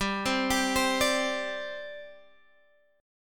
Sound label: G Suspended 4th G down Guitar Half Step Down